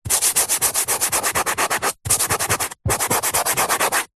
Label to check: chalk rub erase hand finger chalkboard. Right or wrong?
Right